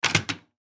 Arcade Game Gun, Arcade Game Shotgun Remove From Holster; Arcades; Blastwave